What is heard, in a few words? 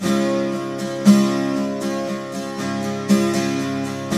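12 string major D, Guitars; Spectrasonics; Hans Zimmer Guitars VOL-1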